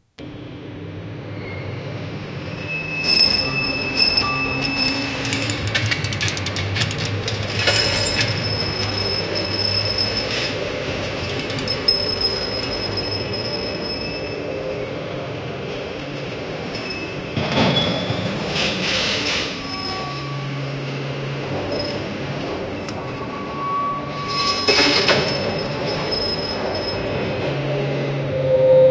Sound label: clangs of heavy machinery